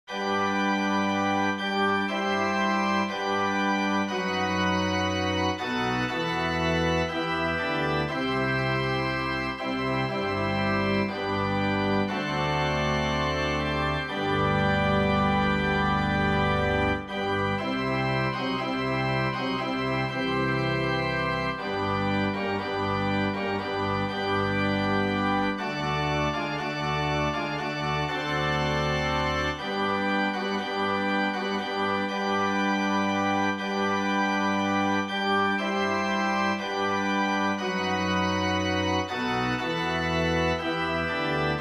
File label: organ